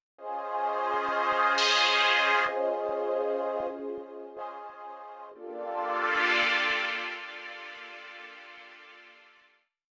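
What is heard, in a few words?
string filters, 129BPM; Pony Fan Music Remix Stems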